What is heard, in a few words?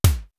HI WISP, East West - Global Sounds; D4 BD SD TOM